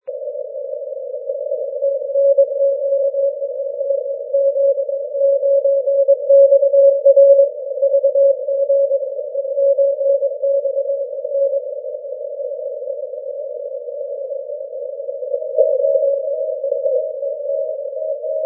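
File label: 80m continuous wave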